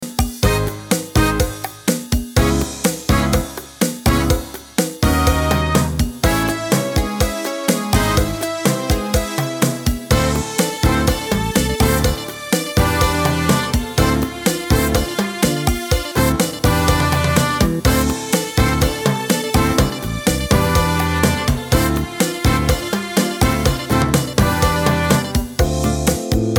cha cha

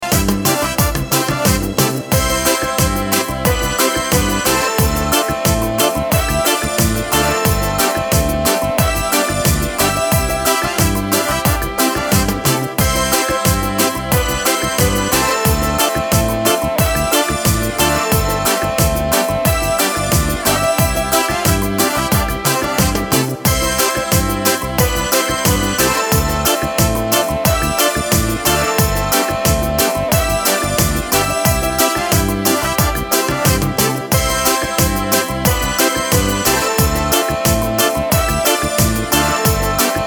cumbia

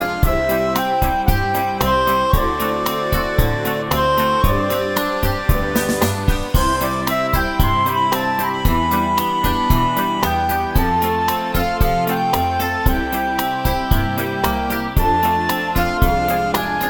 bachata

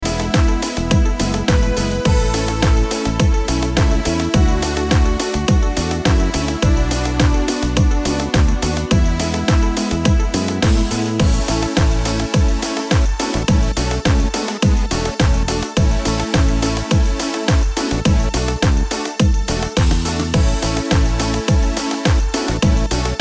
cumbia dance

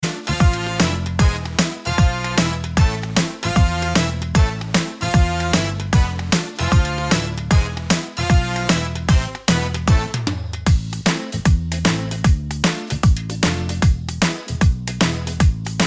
fox mambo

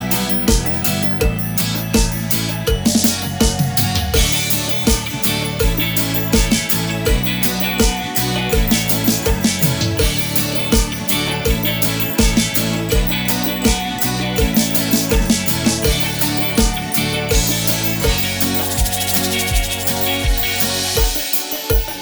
cumbia

